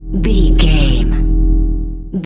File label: hum electric neon light